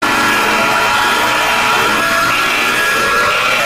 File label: eehee buzz; eevee cry